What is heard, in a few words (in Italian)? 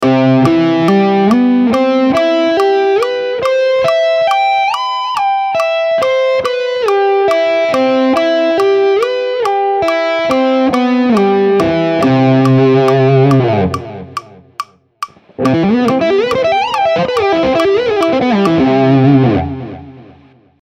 Triade M + Slide 7+